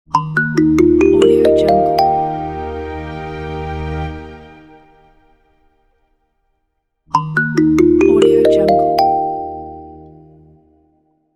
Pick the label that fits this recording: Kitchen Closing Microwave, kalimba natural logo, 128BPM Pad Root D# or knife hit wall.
kalimba natural logo